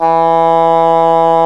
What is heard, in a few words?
horns; Sound & Vision - Gigapack I CD 2 (Roland); Brass&Woods1